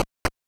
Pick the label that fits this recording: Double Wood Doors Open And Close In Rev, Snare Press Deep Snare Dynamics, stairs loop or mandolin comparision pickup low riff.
stairs loop